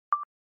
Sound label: ring